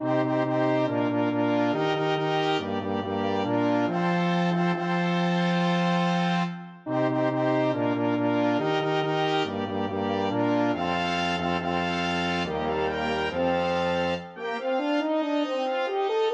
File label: quintet